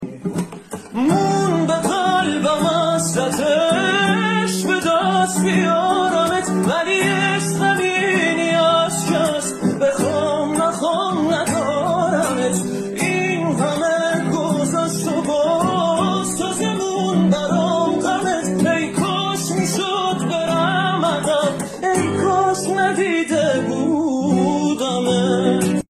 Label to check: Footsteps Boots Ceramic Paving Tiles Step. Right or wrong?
Wrong